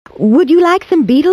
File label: beat